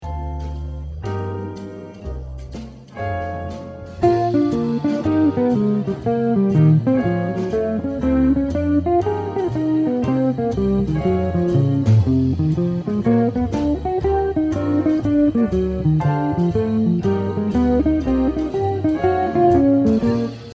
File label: Lick